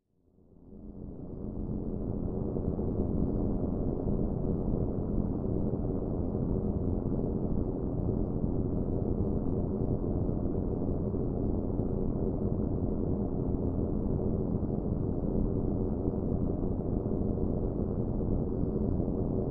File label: Music Eerie Distort